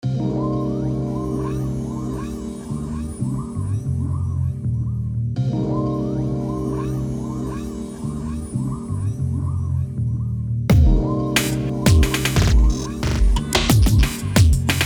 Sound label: loop dark hip hop